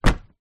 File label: household plastic recycle bin lid down